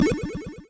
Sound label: speed Boost; Dino Run